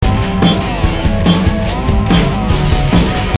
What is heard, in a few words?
loop